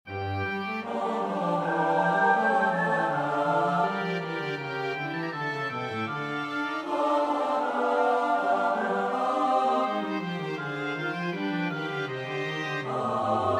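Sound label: soprano alto tenor bass; Bach (Johann Sebastiaan); BWV 044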